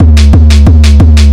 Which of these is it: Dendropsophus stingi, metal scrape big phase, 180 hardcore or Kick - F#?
180 hardcore